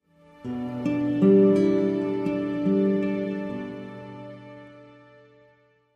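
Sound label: Music Logo Classical Guitar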